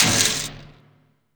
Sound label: BUCKET